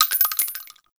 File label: Pistol Shell Droppings; Warframe; Grineer; Orokin